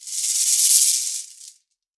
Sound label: MIXED PERC; 90 s Sample CDs